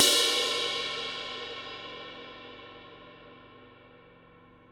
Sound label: ride tri